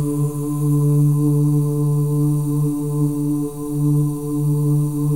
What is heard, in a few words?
BGV OOS, M+F OOS; Spectrasonics; Symphony of Voices